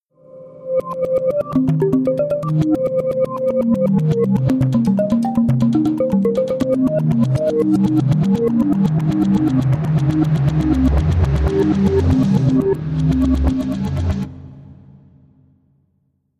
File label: Reverse In The Jungle